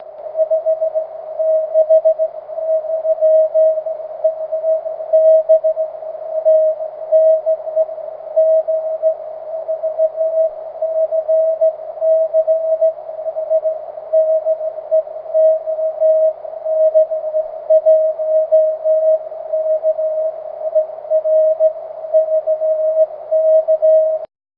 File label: cw